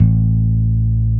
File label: BAS; Best Service Gigapack 2